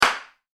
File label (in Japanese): クラッカー